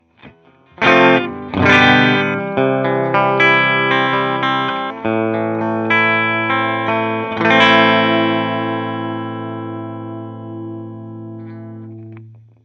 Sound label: Fender Bassroom mic change TUBE 5881; TH-U